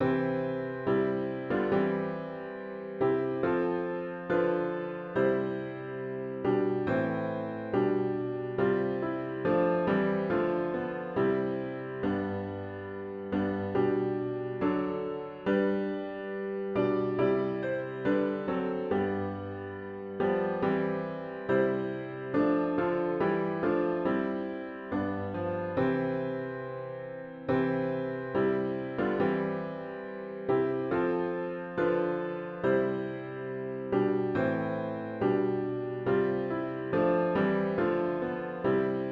piano only; Live into Hope

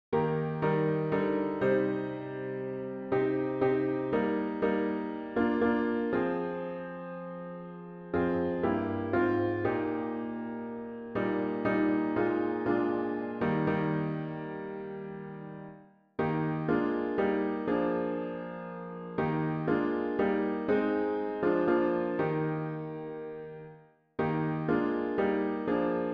Be Still My Soul piano with Intro; worship service

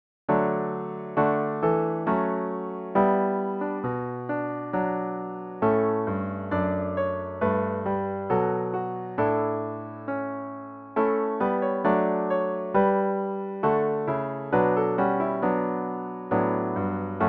piano only; We Walk by Faith and Not by Sight

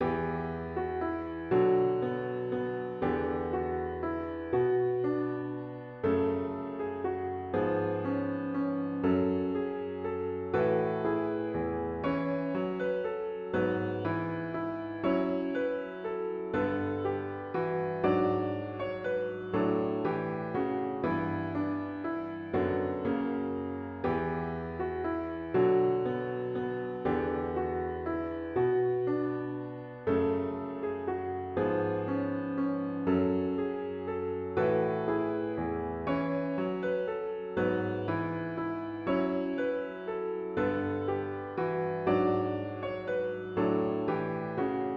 piano only; When We Are Tested

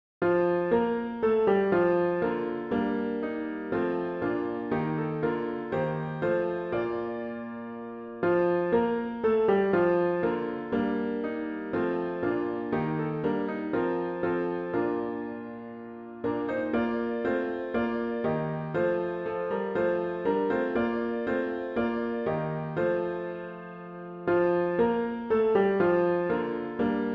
piano only; Hosanna Loud Hosanna

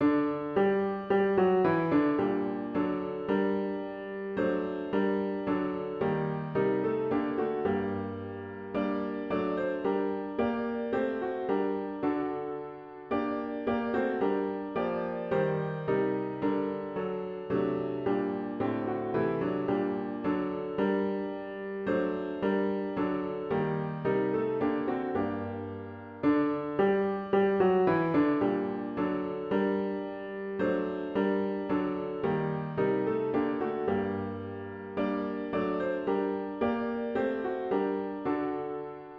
piano only

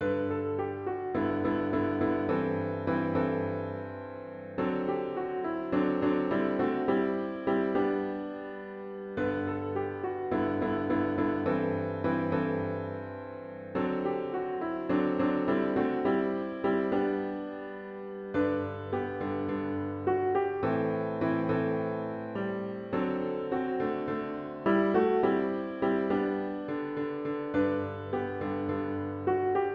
piano only; May the God of Hope Go with Us

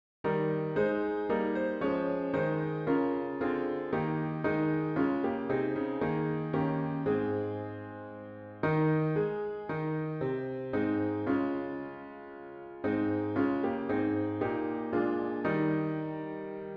Give to the Winds Thy Fears piano with Intro

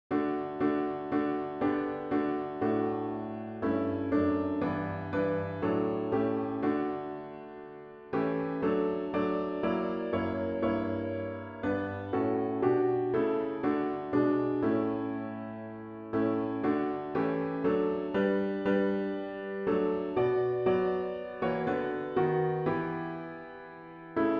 piano only; Great God of Every Blessing

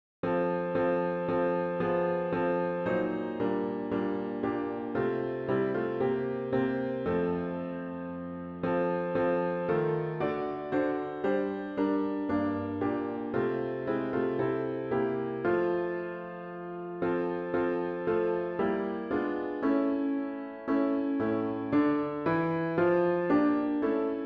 piano only; O Little Town of Bethlehem

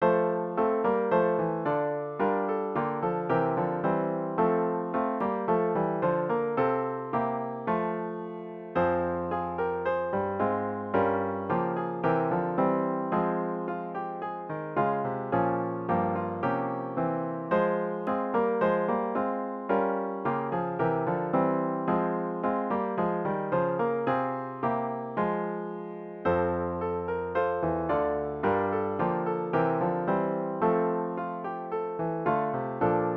Swiftly Pass the Clouds of Glory piano with intro; worship service

